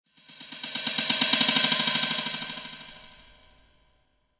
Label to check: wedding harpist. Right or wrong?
Wrong